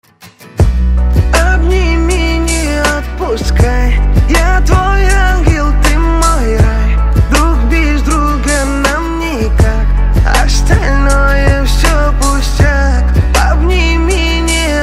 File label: acoustic version